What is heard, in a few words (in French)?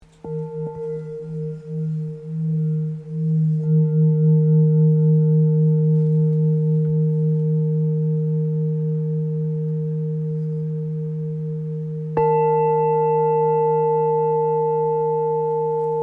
Bol full moon mi